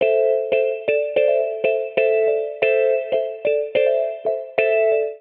Lo Fi FM EP Chords A Minor 92bpm; MIDI Clips; Accompaniment; Ableton; Chop and Swing

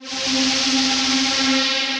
Pads; Heavy Weather

